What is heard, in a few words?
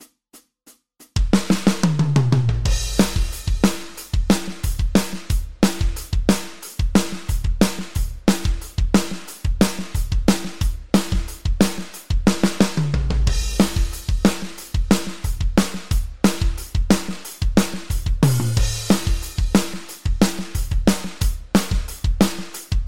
Punk Rock Song, Звуки барабанов в стиле Punk Rock